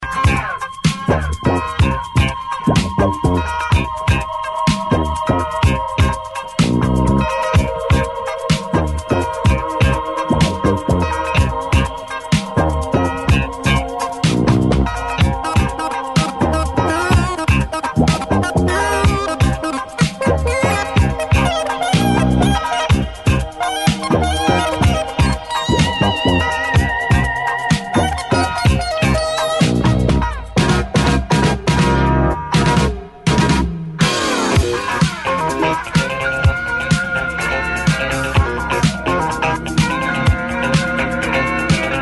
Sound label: space drums